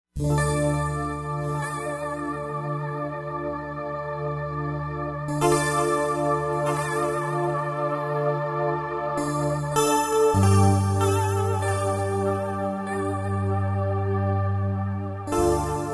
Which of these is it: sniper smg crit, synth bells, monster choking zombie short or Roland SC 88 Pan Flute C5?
synth bells